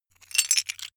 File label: metal pieces clatter short